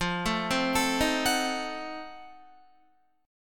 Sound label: F 7th Flat 9th F down Guitar; F7b9; arpeggiated